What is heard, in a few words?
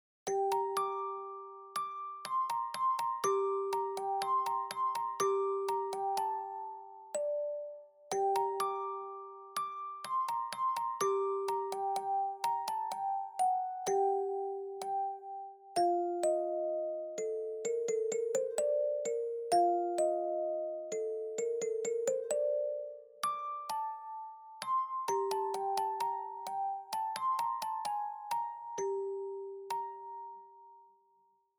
Music Box